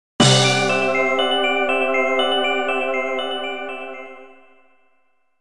Jiggy Appearance; Banjo-Kazooie

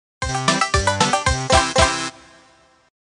Collect Jiggy; Banjo Tooie

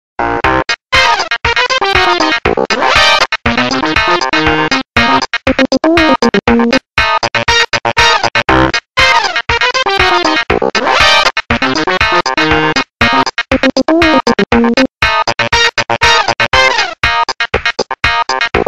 Menu Theme, Music; Banjo-Pilot

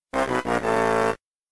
Lose; Banjo Kazooie Grunty s Revenge

